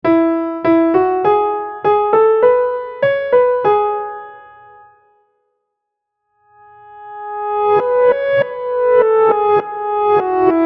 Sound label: piano forwards backwards